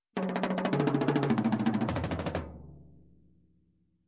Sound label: Fast Tom Fill